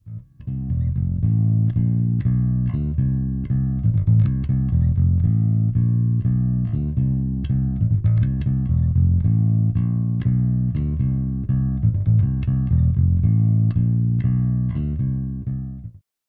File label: Sun CB; Zoom B2 Four